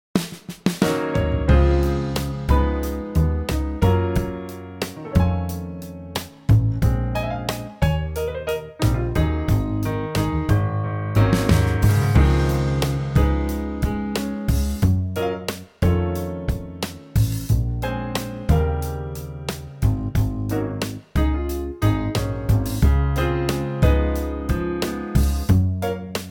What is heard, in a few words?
Trio Db